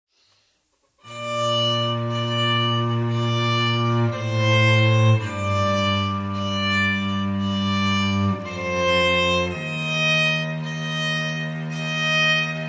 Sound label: Fretless Duo